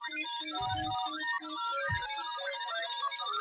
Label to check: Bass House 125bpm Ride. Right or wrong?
Wrong